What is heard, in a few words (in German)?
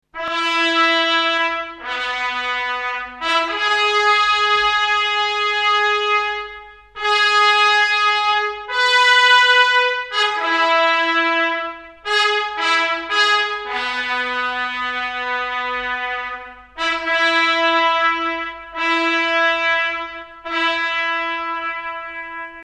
fanfare